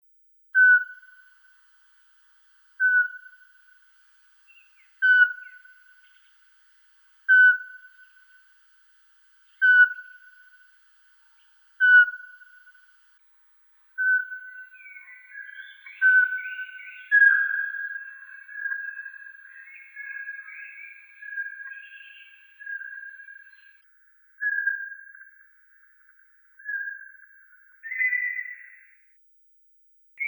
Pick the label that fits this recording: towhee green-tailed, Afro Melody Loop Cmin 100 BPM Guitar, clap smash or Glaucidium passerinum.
Glaucidium passerinum